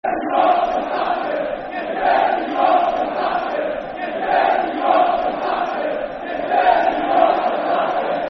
Football Chant, Soccer Song; You Dirty Yorkshire...; Stockport County Scfc

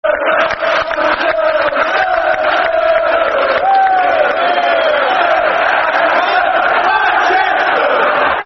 Manchester Football Chant (Soccer Song); Man United

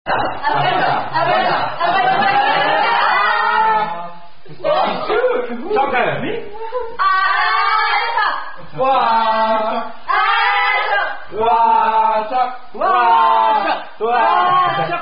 Korean Drinking Games; Related To Bunny Bunny Nondrinking Game

